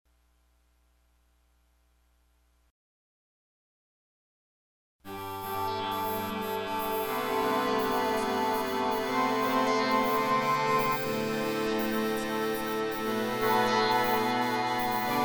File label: synth